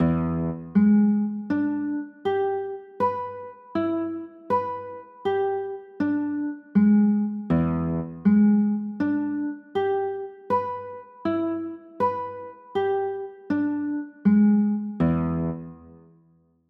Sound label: e 7 sharp 9, chords